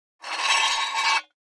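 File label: Metal Scrape On Cement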